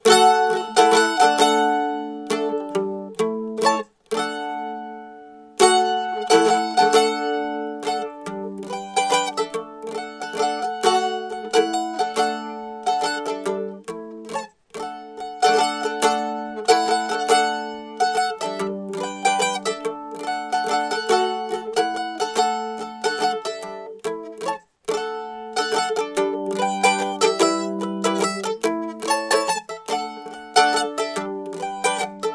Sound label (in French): maz mandoline